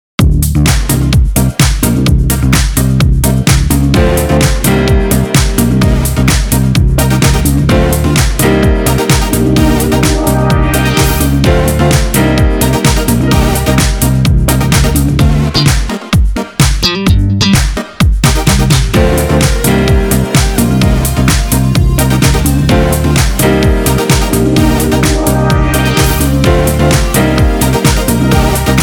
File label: Funk